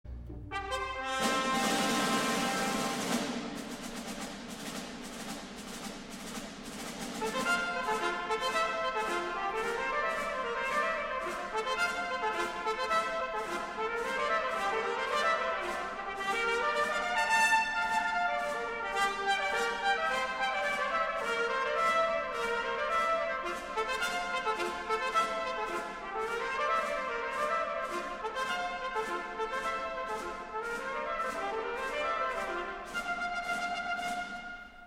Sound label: cornet solo; petrushka